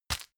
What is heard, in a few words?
tissue grab hard; objekte; taschentuch